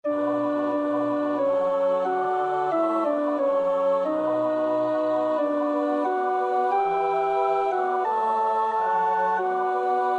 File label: Nun komm, der Heiden Heiland, tenor; Vulpius (Melchior)